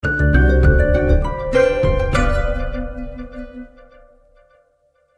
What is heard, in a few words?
Ringtone, BlackBerry 9000 Bold